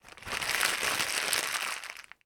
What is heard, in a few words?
packaging plastic movement; sound effects one